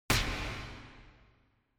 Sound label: Motion Grab Reverb